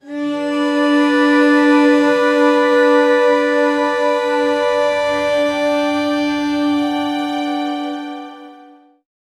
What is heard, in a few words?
soft korean string instru